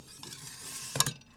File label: Big Knife Sheath; Micro Pack